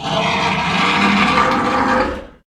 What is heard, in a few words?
boar; kult